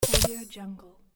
flip card

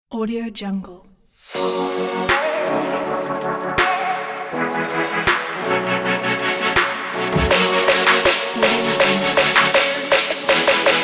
future bass

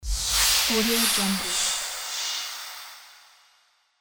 transition

